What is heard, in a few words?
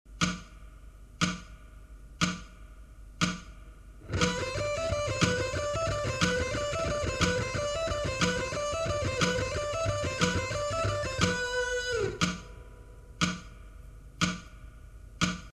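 alternate picking slow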